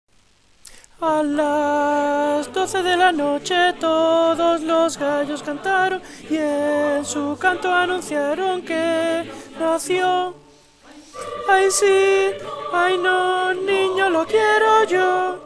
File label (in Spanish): AY SI AY NO CONTRALTOS; navidad